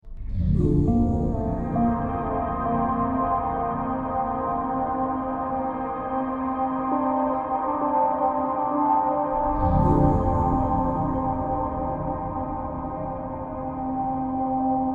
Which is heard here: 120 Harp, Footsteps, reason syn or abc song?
120 Harp